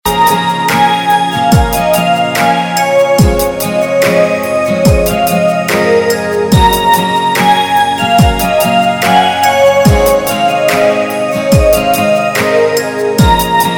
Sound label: Instrumental; Bollywood Hits (2015)